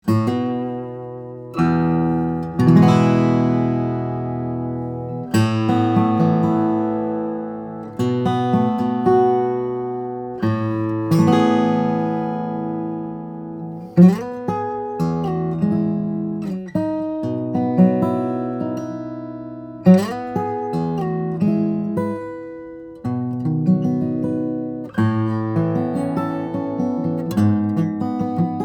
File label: Olson SJ Cutaway, steel-string flattop guitars; brazilian rosewood cedar